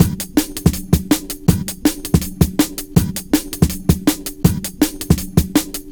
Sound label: Old, Loops, breaks; m8 breaks